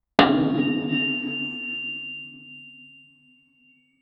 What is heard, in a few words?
powerful blacksmith hamme